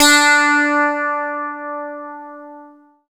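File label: SYN, Roland, synth digital; Sound & Vision - Gigapack I CD 2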